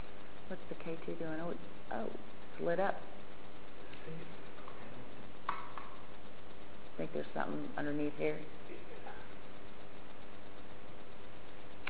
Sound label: laugh